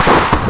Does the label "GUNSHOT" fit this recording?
Yes